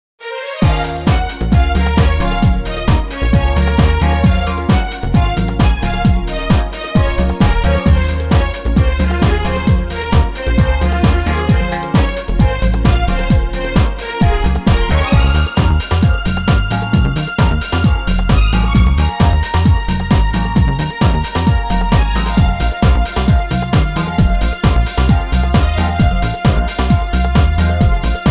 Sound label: Genre Pop